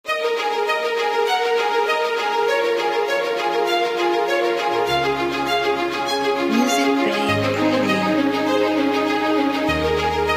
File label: Emotional Dreamy Romantic Trailer